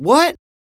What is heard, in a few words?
Beatbox; neuro; Sinistarr